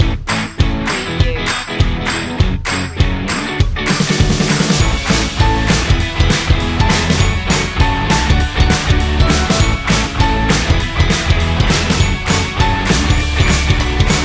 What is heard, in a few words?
cheerful indie rock